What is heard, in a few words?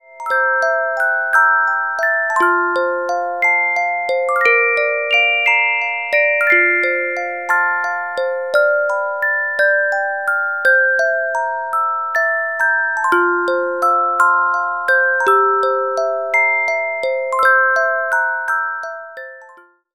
Carillon Music Box Melodies